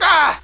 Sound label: blaah; ARCADE; NOS